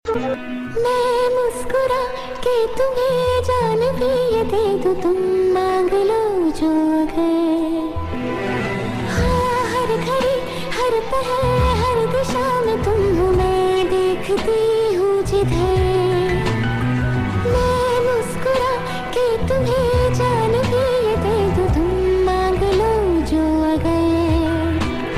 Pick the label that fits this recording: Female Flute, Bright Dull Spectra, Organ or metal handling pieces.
Female Flute